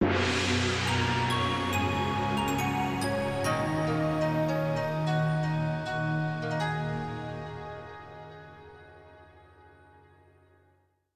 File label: Jingle Item Get; Choju Giga Wars; 3ds ps4 switch